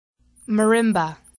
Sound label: marimba